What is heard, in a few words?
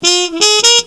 Jazz Snippet